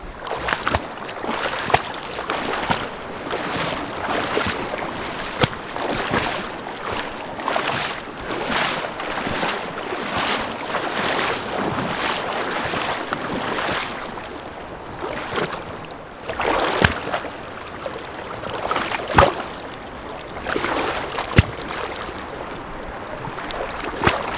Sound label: Footsteps In Water